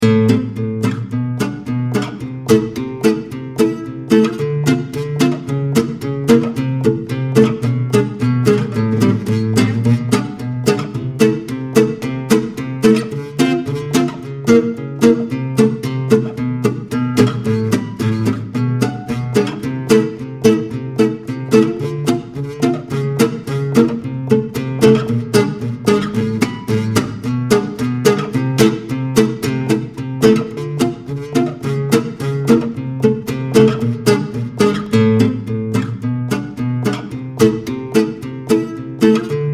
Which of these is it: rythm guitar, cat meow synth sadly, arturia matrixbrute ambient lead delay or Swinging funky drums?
rythm guitar